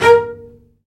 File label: STR, Vcs Odd Marc; STR Vcs Marc-Piz